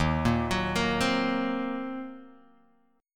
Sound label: D sharp Minor 6th D sharp, down, Guitar, Open F; D#m6 arpeggiated